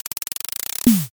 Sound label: drumroll